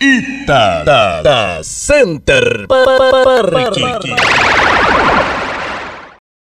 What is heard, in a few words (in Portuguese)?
vinheta